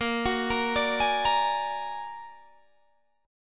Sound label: A sharp Augmented 7th, Guitar Standard; A#+7 arpeggiated; JGuitar